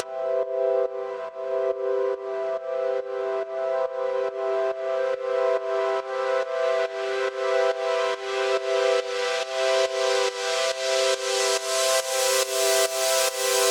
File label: Asterio Poly C; Trap (Трэп)